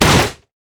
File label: steam golem basic attack; LoL; blitzcrank